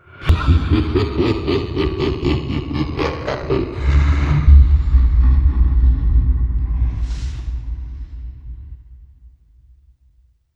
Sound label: laugh